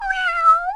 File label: 2 syllable meow 1300Hz; sylvester the cat